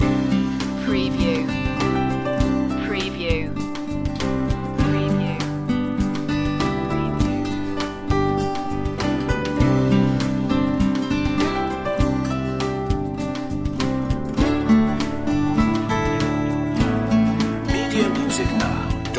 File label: outback ramble loop